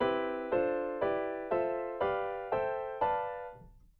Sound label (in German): vierklang